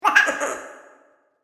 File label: Little Devil Laughter, Evil Laugh; horror; Evil Laugh Sound Effects